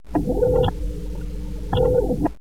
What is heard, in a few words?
door